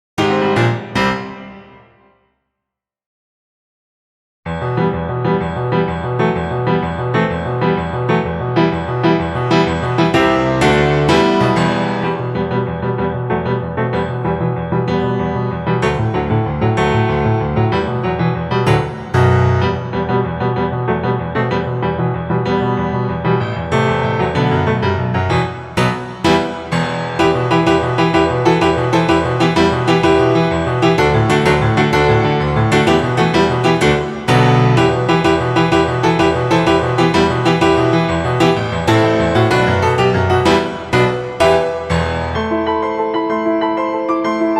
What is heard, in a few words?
rush b but it's an irish jig; smb piano